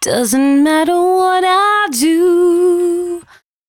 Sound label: 113bpm C#, Velvet Vocal; Zero-G